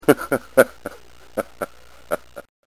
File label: chuckle